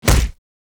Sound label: Skin Scale, Damage